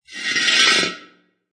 Wheel Drag Short, Car wheel without tyre being dragged on metal floor. Great metal on metal sc; Car wheel without tyre being dragged on metal floor. Great metal on metal scraping sound. Metal slide, Short.